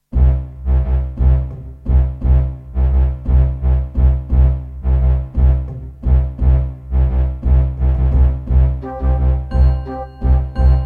Midiplus MiniEngine USB

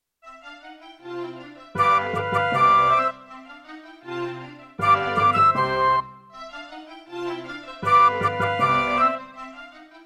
Concerto partial, Roland XV-2020; classical; Mozart; Concerto K467 partial

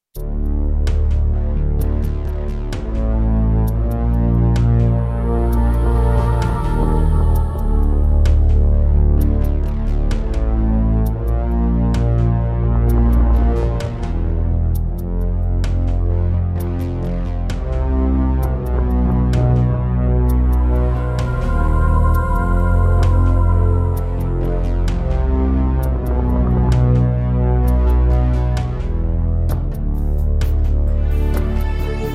Roland JV-1010; Descent II

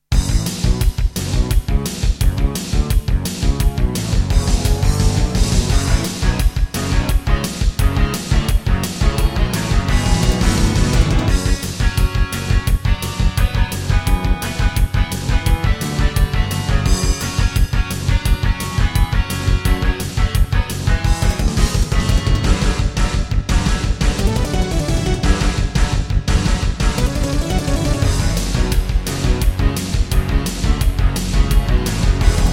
Midiplus MiniEngine USB; Duke Nukem 3D

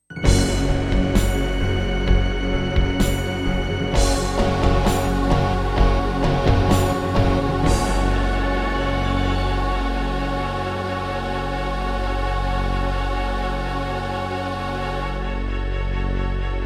Introduction, Turtle Beach Rio; Doom (Sigil)

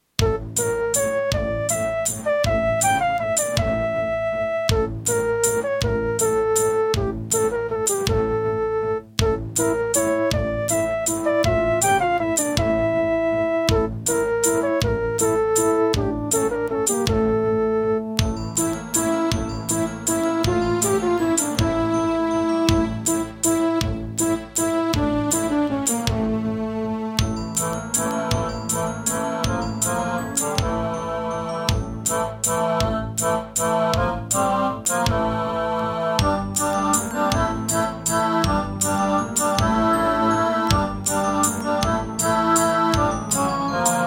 Pine PT-2030 Vivaldi Xtra Wavetable DB

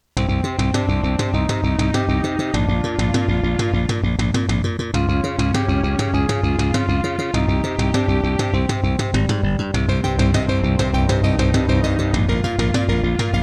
Introduction, Roland CM-500; Hocus Pocus

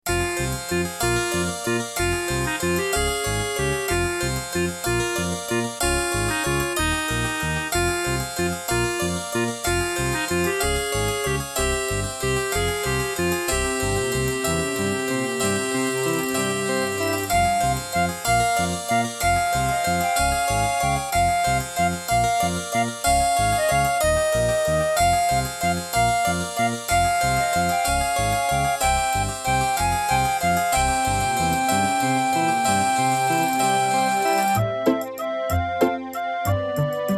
Track 6, Pine PT-202 Vivaldi Wavetable; Death Gate

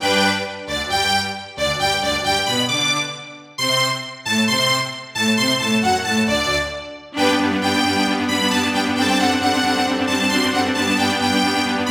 Eine Kleine Nachtmusik, Yamaha DD-55; classical; Mozart; Eine Kleine Nachtmusik partial

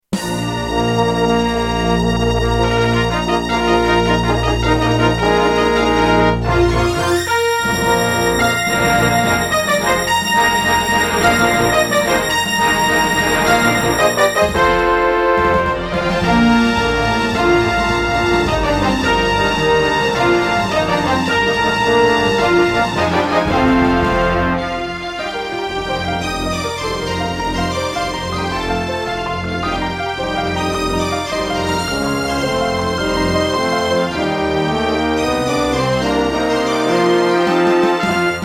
Intro, Turtle Beach Maui; Dark Forces

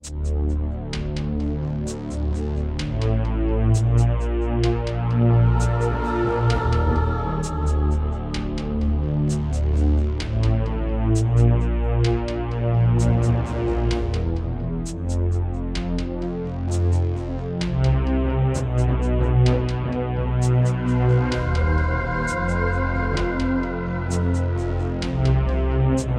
Casio LD-80; Descent II - Briefing

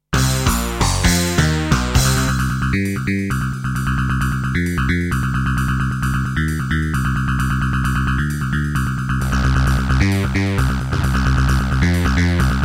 Creative Midi Blaster MB10; sigil doom episode 5

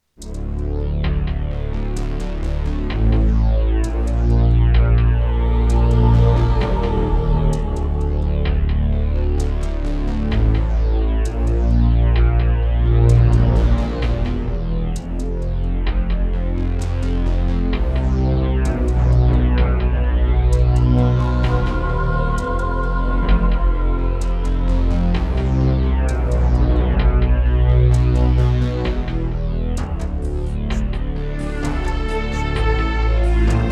Roland CM-500; Descent II

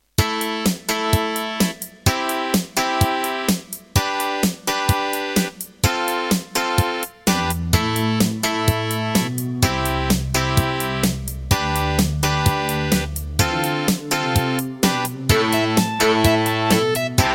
Wave 1 [Dreamblaster S1]; Serdaco; raptor call of the shadows

